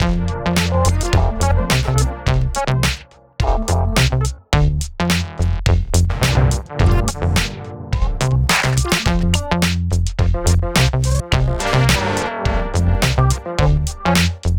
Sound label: matrix simpler synth